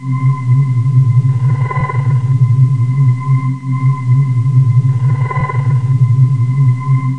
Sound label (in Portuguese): Som Fundo Boneco; Herois